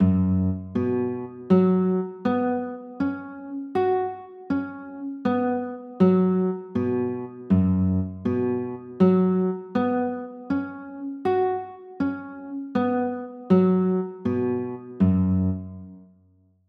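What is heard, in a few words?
G flat sus4 chord, guitar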